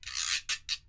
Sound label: Toca Guiro